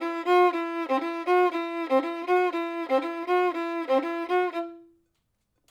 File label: violin